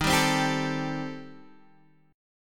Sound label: D sharp 6th Flat 5th, Guitar, Open F; strummed; D#6b5; 15,14,12,14,12,x; JGuitar